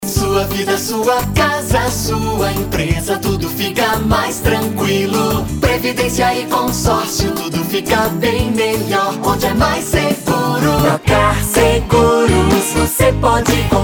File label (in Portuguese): jingles seguro; mundi seguro